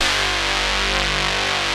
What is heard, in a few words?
G1, Classic Guitars; BANTU; E-MU Formula 4000 Series Vol. 3; Analog Odyssey